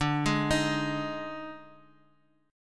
D sharp Major 7th Suspended 4th, D

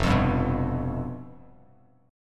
F Suspended 2nd Flat 5th, Bass Standard; strummed; 1,8,5,4; JGuitar

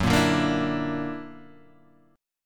F sharp Augmented 7th F sharp, F#+7 chord; F#+7 strummed; 2,1,0,3,3,0

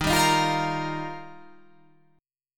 D sharp Major Add 9th

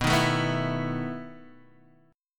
E Major 7th Suspended 4th, B

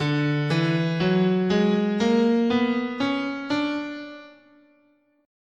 D Lydian Augmented, scale

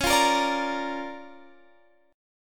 D Minor Major 7th Sharp 5th, C sharp

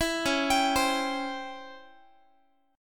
Db Minor Major 7th Flat 5th Db down Guitar; DbmM7b5 arpeggiated; JGuitar

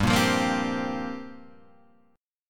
G Minor 11th down Guitar Standard; Gm11 strummed; JGuitar

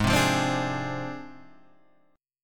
Ab 6th Flat 5th Ab

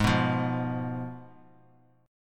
Ab Minor 6th Ab, down; Abm6 strummed; JGuitar

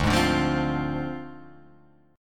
D Minor 7th Flat 5th; Dm7b5; strummed

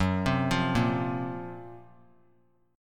Gb Major 7th Flat 5th; GbM7b5 arpeggiated; JGuitar

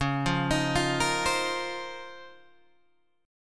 Db Major 13th, Guitar Open G

